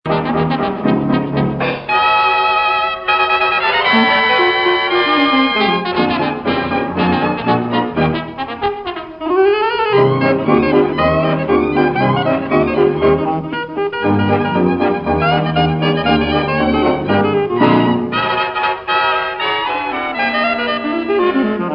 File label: Orchestra, big band 20s